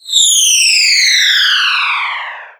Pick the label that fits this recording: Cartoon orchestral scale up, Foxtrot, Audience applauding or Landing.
Landing